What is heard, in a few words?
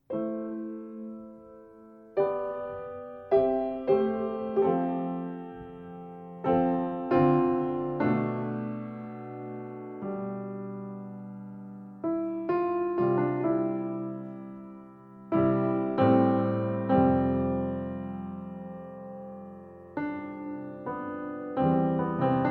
Ballade